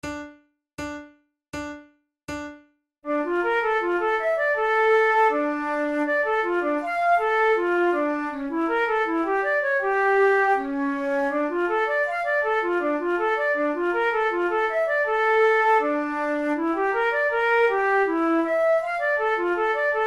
duets, flute; forty progressive duets

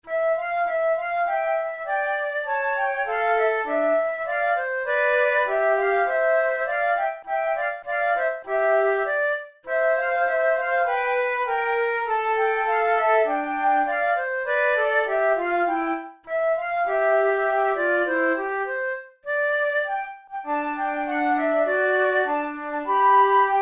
methode de flute; tulou

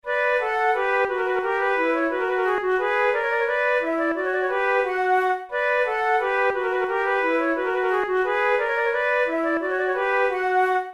concerts for two flutes gavotte; monteclair

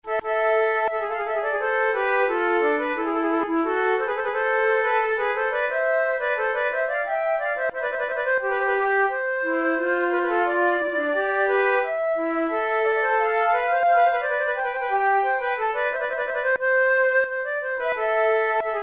for two flutes lallemande; Monteclair; 6 concerts; flute tunes

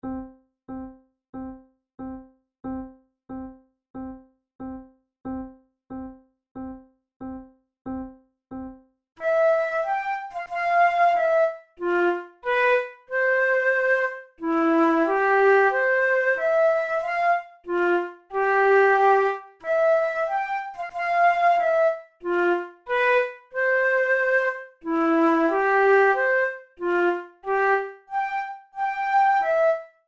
methode de flute; tulou

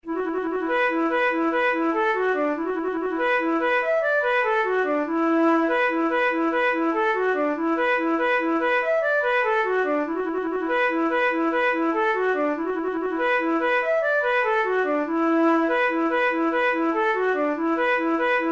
fancy jig, flute tunes; Paddy Stack's fancy jig

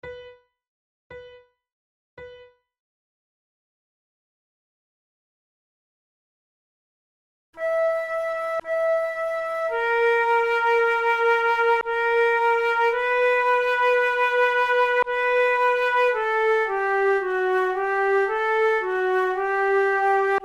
largo, flute tunes; loeillet de gant op5 no1 iv

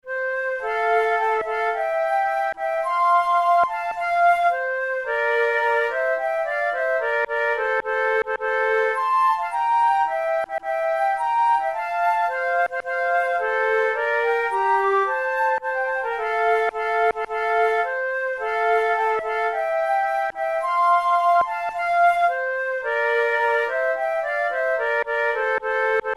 march duet, flute; lord cornwallis

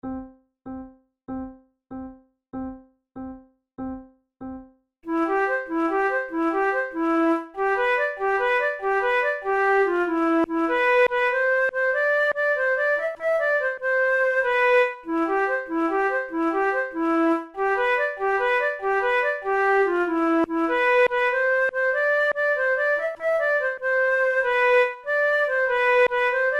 pour la flute duos allegretto; devienne; nouvelle methode

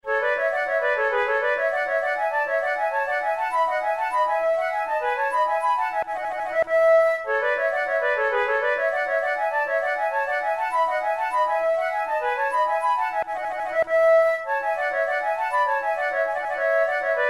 concerts for two flutes; monteclair; badinage

